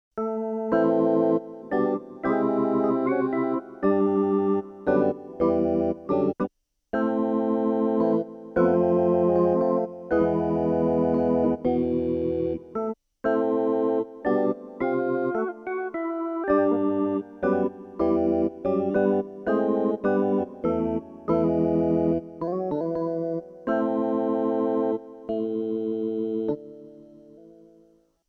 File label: Casio Guitar